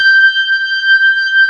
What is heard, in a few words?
B3 G 5, organ